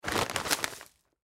Sugar Bag Set Down, Sugar Bag Set Down Movement Food Salt; Household; Blastwave